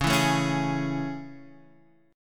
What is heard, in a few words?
A Major 7th C sharp